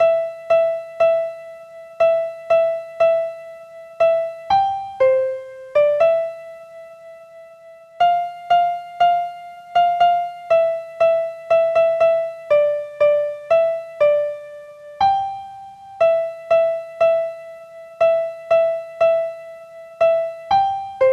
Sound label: Piano Game Jingle Bells; christmas carol; nds